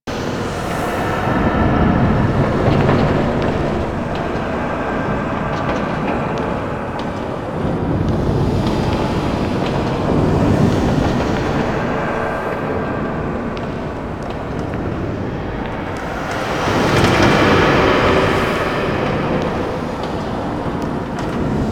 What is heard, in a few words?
Fortress Interior Amb